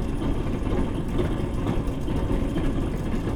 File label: gate turning; space-exploration 0.5.80